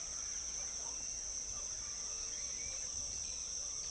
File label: jungle loop; fx pcm stereo; Black & White